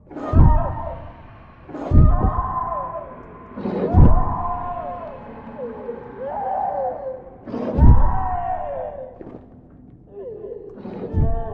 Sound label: amb torture